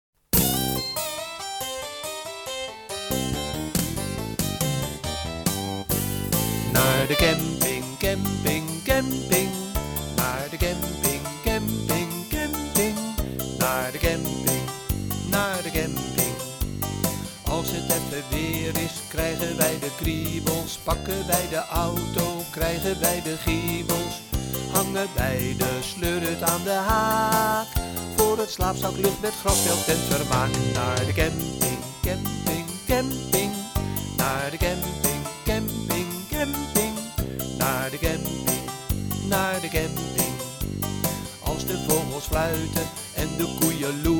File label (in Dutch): zang